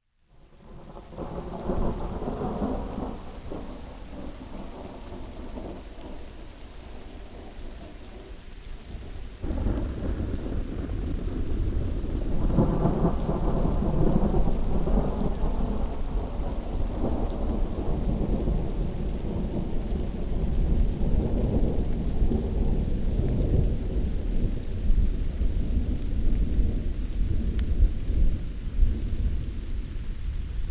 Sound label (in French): Tonnerre et la pluie; bruitage; Climat